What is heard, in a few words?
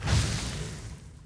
MOVE; C64 Dreams; Multi-Sound Default